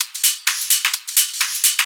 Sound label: Hihat Loop 128 BPM